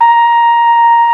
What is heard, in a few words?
BRS Flugelhorn, Roland; Roland L-CDX-03 Disk 2